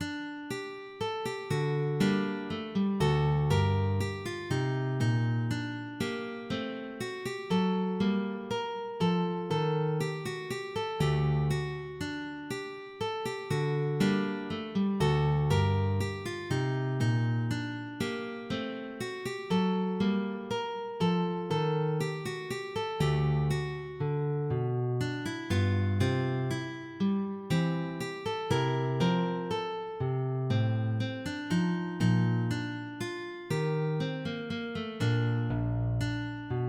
Sarabanda Largo

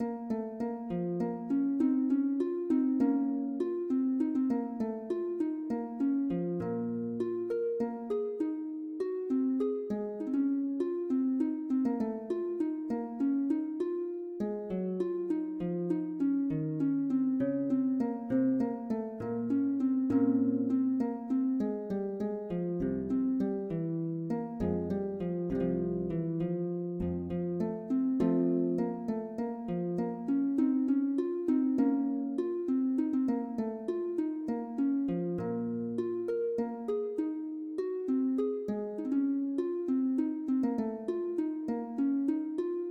Gigue

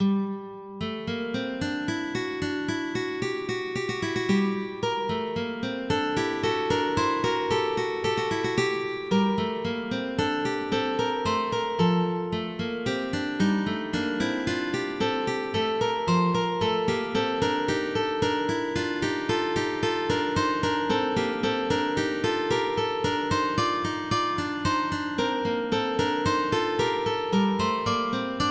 Canon for two lutes, MIDI

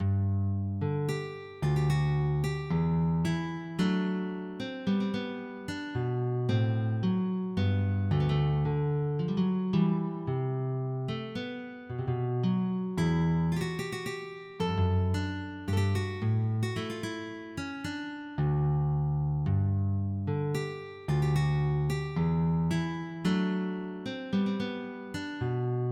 Sarabande, MIDI

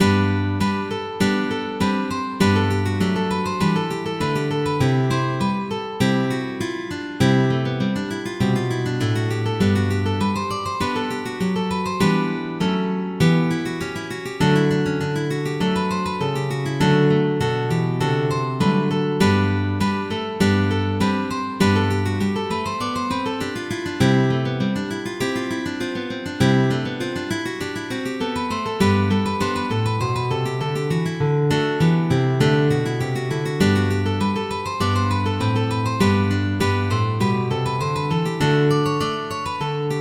Passomezzo

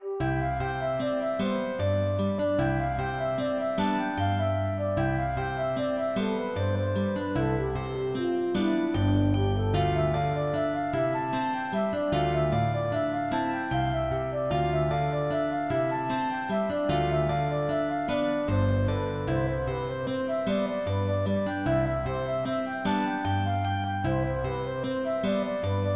Valse écossaise, MIDI

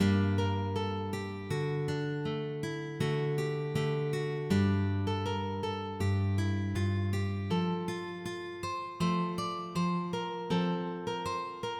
pavane; Spanish

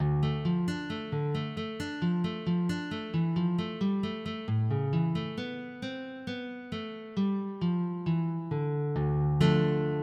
Prélude, MIDI

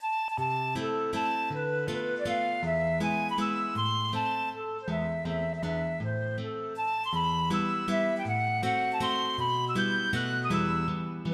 Menuetto

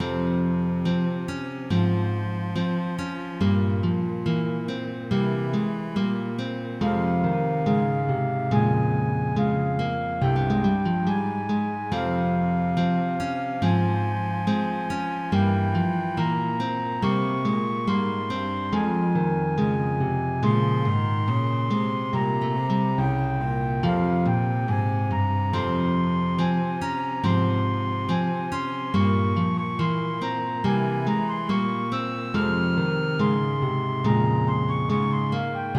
Marche, MIDI

